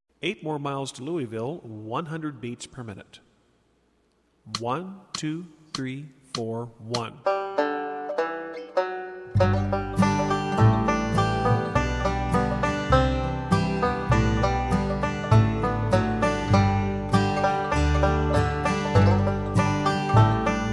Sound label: 100bpm, banjo